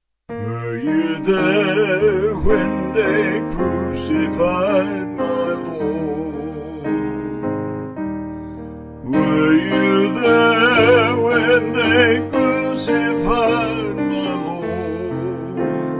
Vocal Piano; Were You There

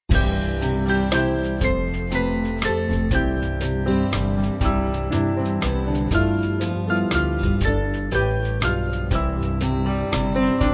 Band, Backing Files for Churches

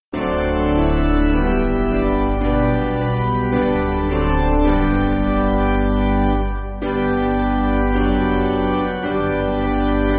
Piano

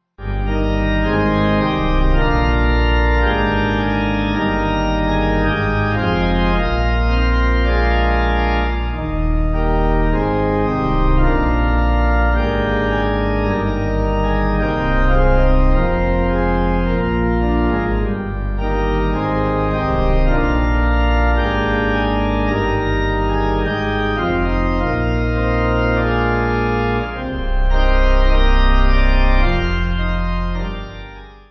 Pipe

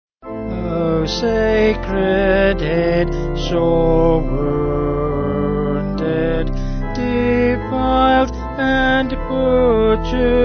Vocals Organ; O Sacred Head

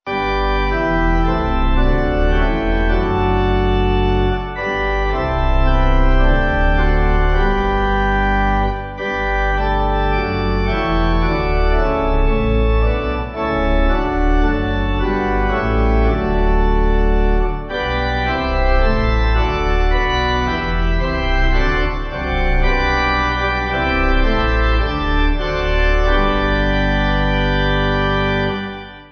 Pipe

